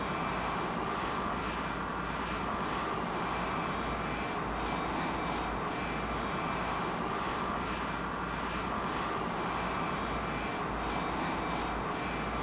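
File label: Engine Combo Exterior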